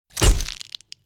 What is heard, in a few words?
flash bulb; Film Noir; SoundPad